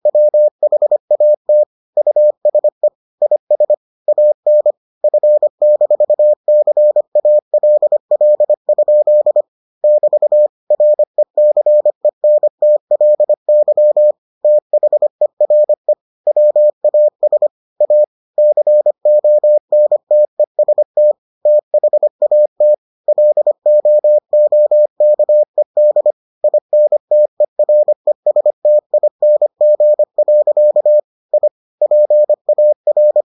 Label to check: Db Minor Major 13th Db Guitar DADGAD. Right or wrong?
Wrong